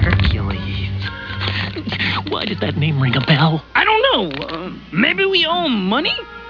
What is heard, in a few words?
pain